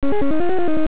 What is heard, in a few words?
Amiga 1000 Startup